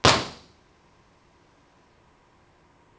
Study ImpResp

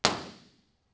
Lecture ImpResp

